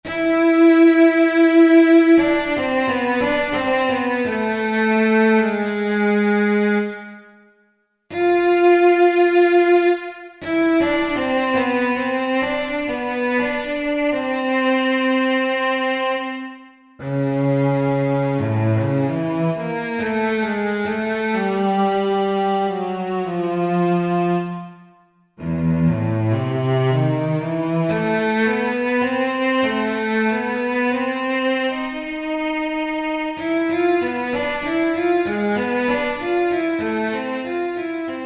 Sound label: Solo Vc, Orchestral